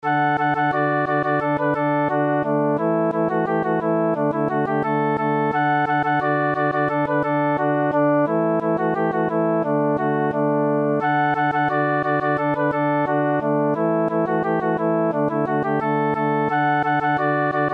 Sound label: melody; Flop Eared Mule